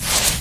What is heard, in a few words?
sand dug; everness